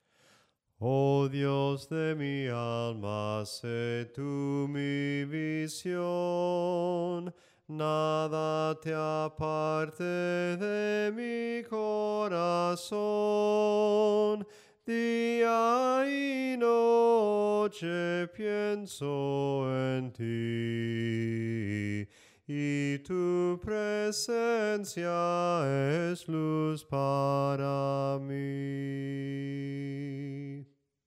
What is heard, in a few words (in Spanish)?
SOPRANO CANTADO; OH DIOS SE MI VISION